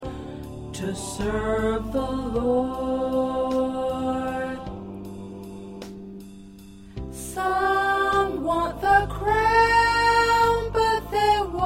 Soprano

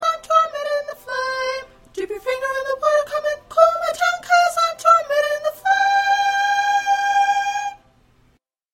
Soprano; soprano 1a part

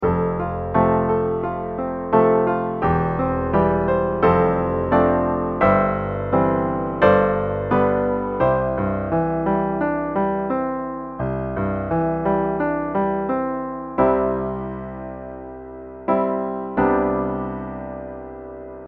Piano

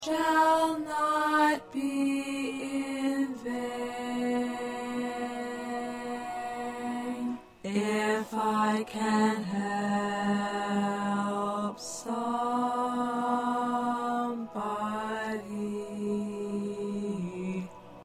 1st Tenor

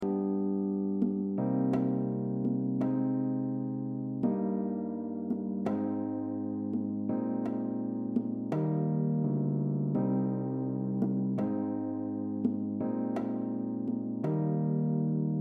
Accompaniment

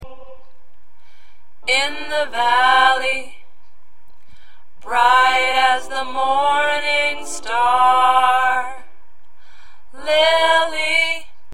Tenor, choir

